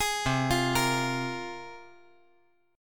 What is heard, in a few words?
C 7th Suspended 4th Sharp 5th, C, down